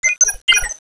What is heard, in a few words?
patrol drone patrol